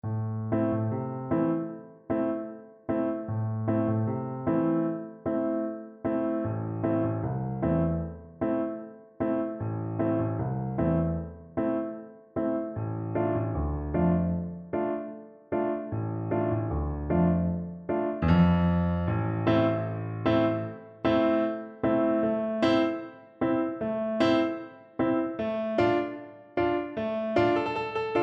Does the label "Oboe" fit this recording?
Yes